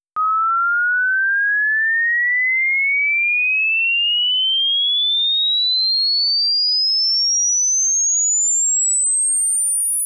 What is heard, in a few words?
1.25kHz to 10kHz